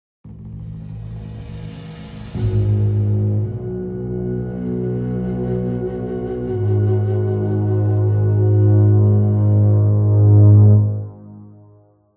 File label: Tension Set Up